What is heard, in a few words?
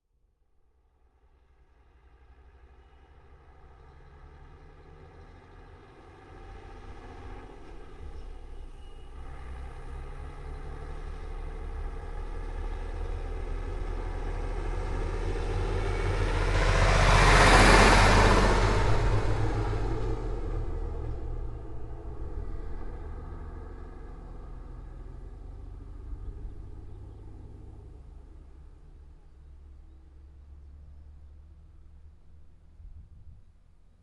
truck ural driveby close perspective